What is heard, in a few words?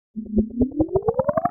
ufo takeoff